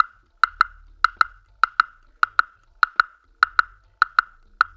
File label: Woodblock, Percussion Loops; Ambient Grooves